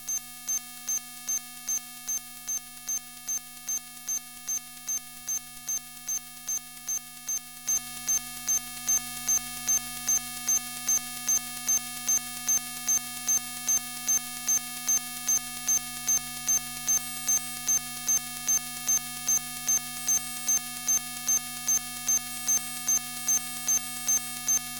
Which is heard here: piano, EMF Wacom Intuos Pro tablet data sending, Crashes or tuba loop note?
EMF Wacom Intuos Pro tablet data sending